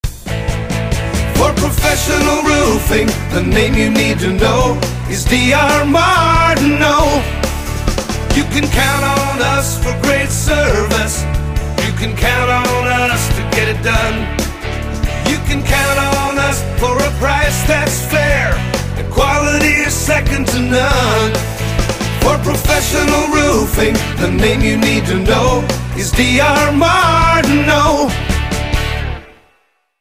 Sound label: roofing company radio ad jingle